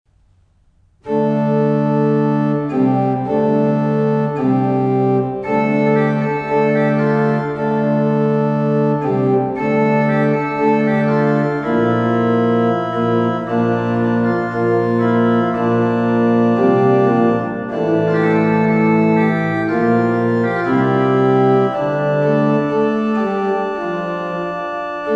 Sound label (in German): orgelvorspiel; Alle Menschen höret